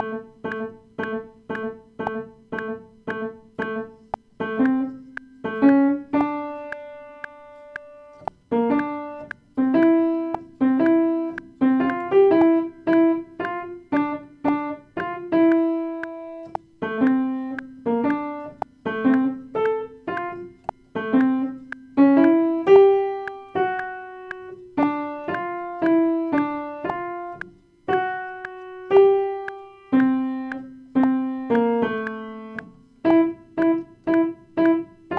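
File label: soprano 1 practice, piano practice; christmas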